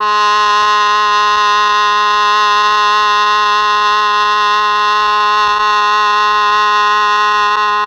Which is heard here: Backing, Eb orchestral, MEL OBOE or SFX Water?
MEL OBOE